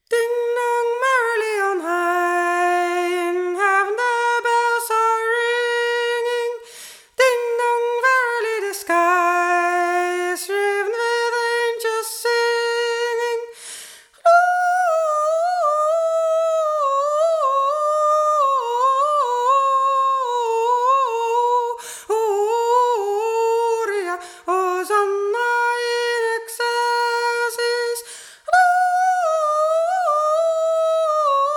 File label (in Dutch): Ding-dong SOPRAAN